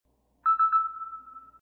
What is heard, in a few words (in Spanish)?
alarma